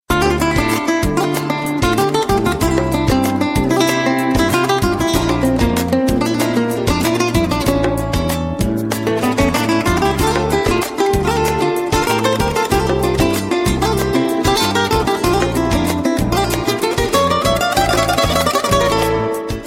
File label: guitar ringtone; christian